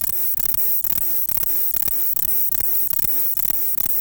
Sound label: song sierranus, katydids neduba